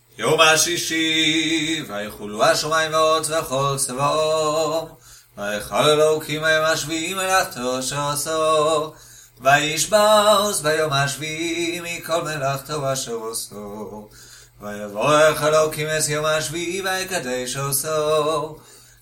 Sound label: Kiddush, Ashkenaz tune; nusach; tune for kiddush